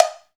COWB, Cow Bells, Roland

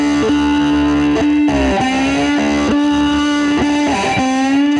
Lap Steel Fuzz 100 D, guitar drone

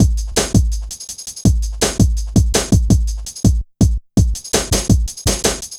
Ezee Rolla, Drum Loops

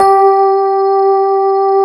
GRAND PF G 3, AKAI S-Series

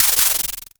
NOIZ MOD, Dual-Percussion-Synthesiser; Klone; KLONE FX; Drum Machines

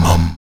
VOX MUM E 2C, Afro Chants, Stacc Mums; Roland LCDP11 Africa VOL-1

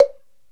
PRC TUNED B, Orchestral Blocks; Roland LCDP03 Orchestral Perc; Orch Toys

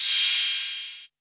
Hat, Drum & Bass

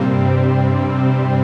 PAD, Drum & Bass, 160 BPM; Ueberschall; Drum & Bass Resonance

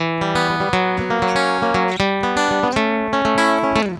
GUITAR SALSA

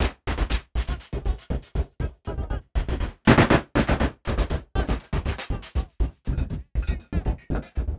Envelope Beat; Drums & Drones

